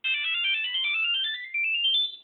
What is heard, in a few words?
nokia 1100 long scale ringtone